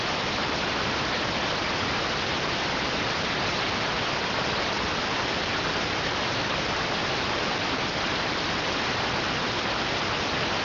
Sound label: rtty rx